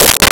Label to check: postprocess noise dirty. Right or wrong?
Wrong